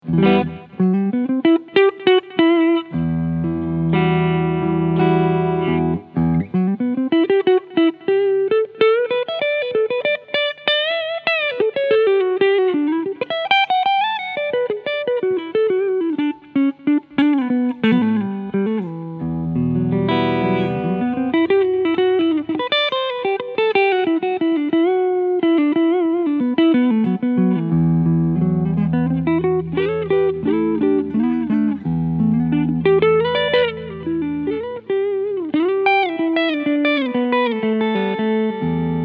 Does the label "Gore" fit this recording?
No